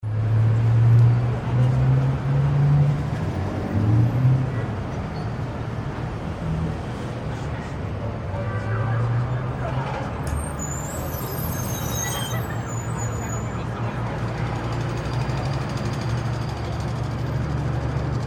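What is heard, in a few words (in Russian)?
стройка в большом городе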